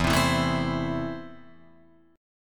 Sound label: E Suspended 4th Sharp 5th, Guitar Open F; Esus4#5; strummed